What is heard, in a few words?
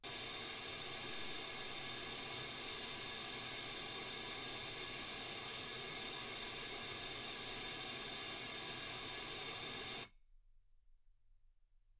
wgn